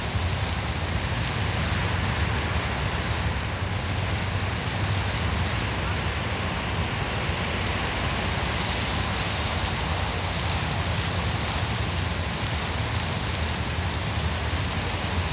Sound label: Wind Through Grass Loop; Environment; BackgroundLoops